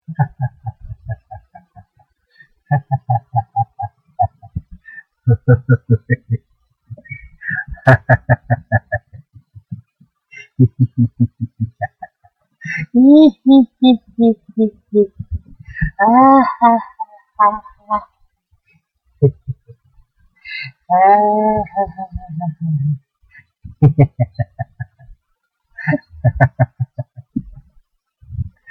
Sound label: one minute laugh